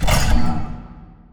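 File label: tractor beam emitter middle; props; Portal 2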